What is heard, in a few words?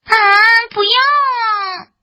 Monster Death; yule; fdfish